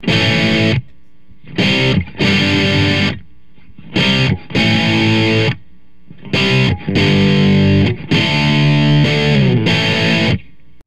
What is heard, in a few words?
tubeking